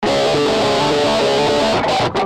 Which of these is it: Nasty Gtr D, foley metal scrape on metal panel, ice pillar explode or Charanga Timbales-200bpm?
Nasty Gtr D